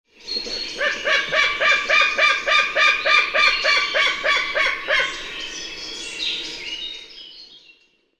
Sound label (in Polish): bielik zwyczajny haliaeetus albicilla albicilla